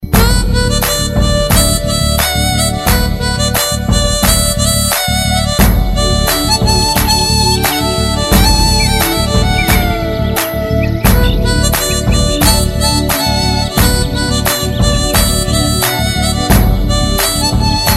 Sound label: mouthhorn, ringtone; padayappa